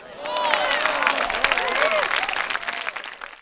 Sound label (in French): FOULE; V-Rally